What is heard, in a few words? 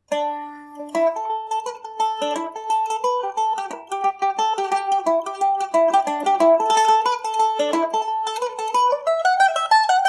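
md304 A dorian, Irish mandolin; maids of mount kisco revisited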